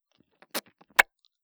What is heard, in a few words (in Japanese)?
ちゅぽん, 効果音